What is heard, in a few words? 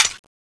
clip out; tfa cso; m1911a1